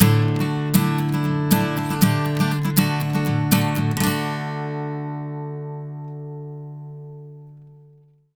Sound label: 120 MAJ 2 CHD D, Acoustic Guitar Loops; Electric & Acoustic Guitar Loops; CHORDS; USB Soundscan vol.04; AKAI